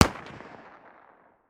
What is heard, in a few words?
medium distance, 338lapua; tacz-tweak-pack; taczmechanics